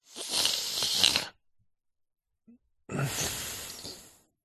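human male asleep breathing interruption nasal snotty pause exhale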